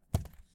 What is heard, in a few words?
Heavy cam drop; Phasmophobia